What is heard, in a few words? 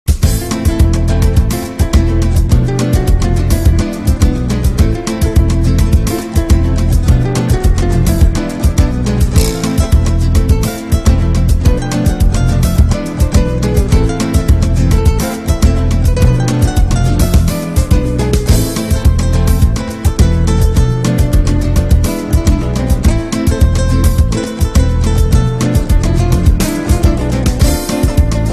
samba